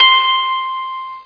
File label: Harp C6